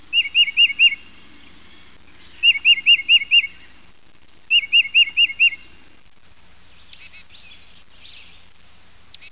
tufted titmouse, songs

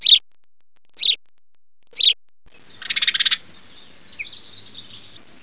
house sparrow, songs

